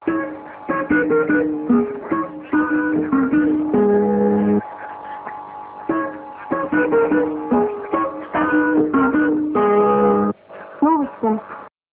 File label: jingle